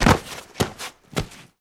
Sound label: Body Falls On Cement